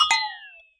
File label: glock fx; Univers Sons - Raricussions